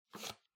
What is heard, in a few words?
Music Box Foley Card Remove